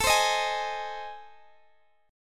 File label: A Minor Major 7th Flat 5th, Guitar DADGAD